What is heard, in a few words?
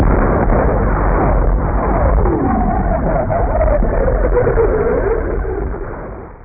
bomb; NEMESES